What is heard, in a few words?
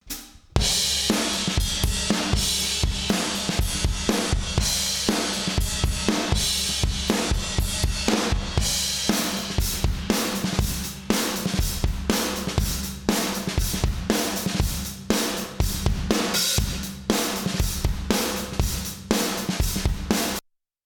Drums